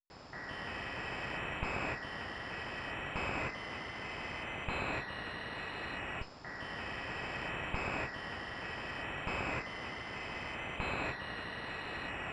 157 BPM D# Min Noise; Melody; Loop Stems; Cymatics; 2022 Melody Collection Platinum Expansion - Pt 2; Psychic

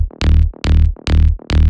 Bassline Offbounce D# 140 BPM; Loops

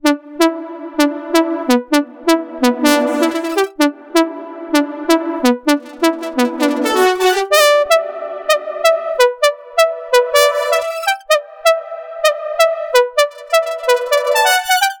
128 BPM E Min Pluck; House; Drop Loops; Loop Stems; Cymatics; Loud

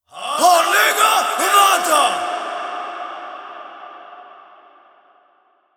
Processed Battle Shouts, Viking Vocal

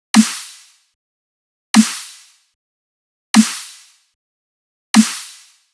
Dubstep Drum Loop Snare, 150bpm; Stem Seperated

